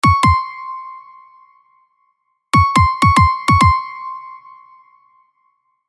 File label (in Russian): uvedomlenie